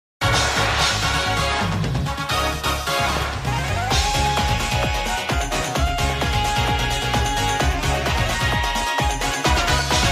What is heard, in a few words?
Battle Music; Hop